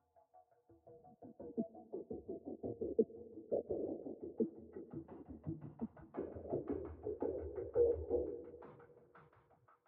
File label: Basic Low Beat 85bpm; Legends of Pegasus